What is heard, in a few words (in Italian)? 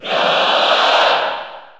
Cheer Italian; R.O.B.; SSBU